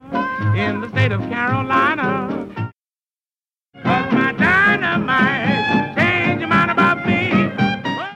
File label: roughness